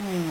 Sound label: enemy death, bitcrushed voice one shots